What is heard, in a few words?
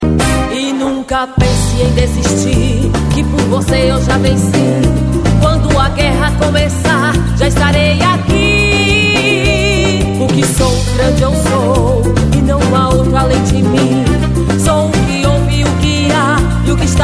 ringtone; israel